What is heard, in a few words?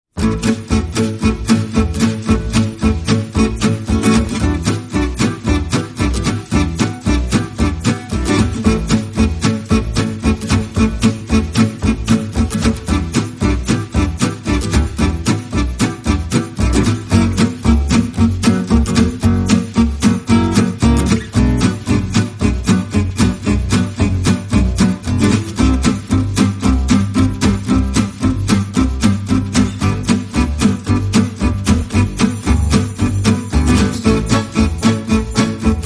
No Lead Melody